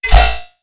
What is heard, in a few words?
max min operator, bass